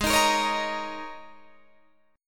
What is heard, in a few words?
G sharp Suspended 2nd Suspended 4th G sharp Guitar DADGAD; 18,18,20,18,18,20